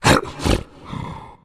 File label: tank dormant, voice breathe; Left 4 Dead; Source Engine